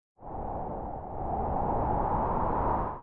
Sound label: cloudy weather